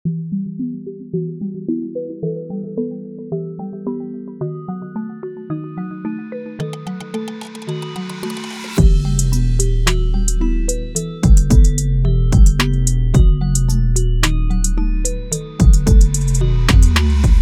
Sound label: Trap Vibes